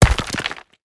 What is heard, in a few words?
hit; smite; geb